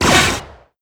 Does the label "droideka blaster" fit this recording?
Yes